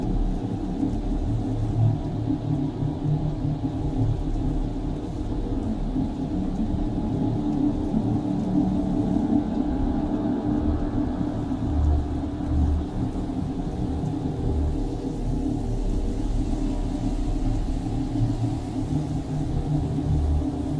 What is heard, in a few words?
ship battleship idle; Stellaris; machineage